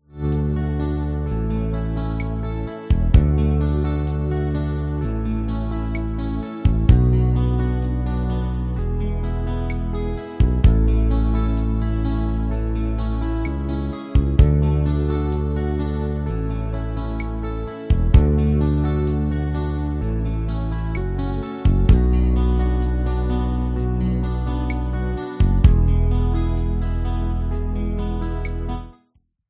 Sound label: instrumental karaoke